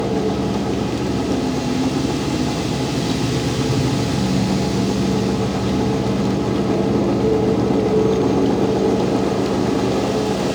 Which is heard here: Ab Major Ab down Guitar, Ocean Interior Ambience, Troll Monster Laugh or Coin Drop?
Ocean Interior Ambience